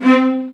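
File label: VIOLAS D4, Strings; ILIO; Synclavier Strings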